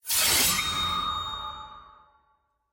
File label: sfx mini wings emerald; challenges